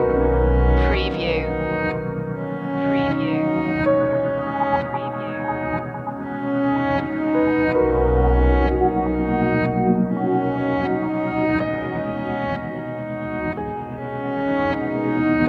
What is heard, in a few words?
deep technology loop